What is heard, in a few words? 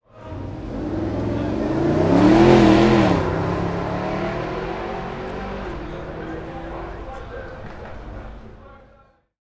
Mercedes-Benz SLS AMG